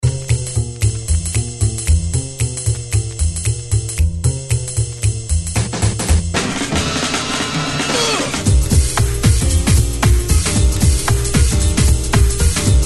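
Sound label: hip hop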